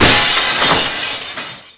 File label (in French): vitrine